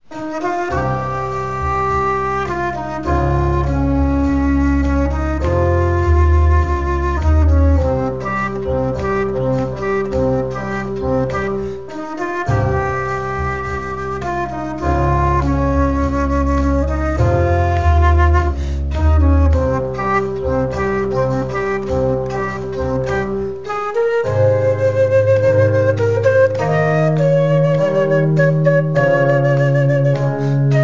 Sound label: flute and playback piano